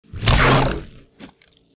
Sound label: melee